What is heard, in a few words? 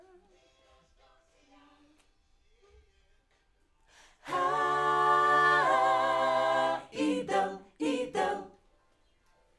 Backs AKG